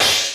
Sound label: CHOKE, Beatmachine; Hollywood Edge; The Big Bad Beatmachine; XE-8